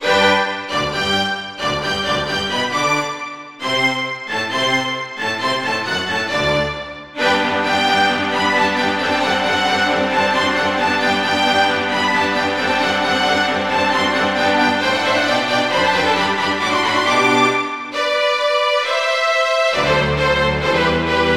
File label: Yamaha SHS-500; Mozart - Eine Kleine Nachtmusik partial; classical